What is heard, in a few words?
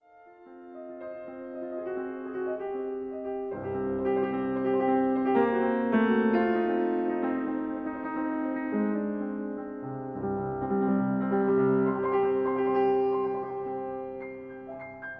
Etude Tableau C-dur Allegro